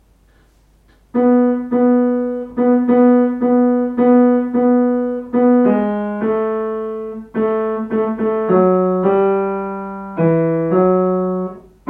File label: piano; sestina